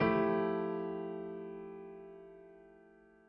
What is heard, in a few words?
Acoustic Piano, Loops